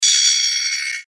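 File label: hi freq high frequency chirp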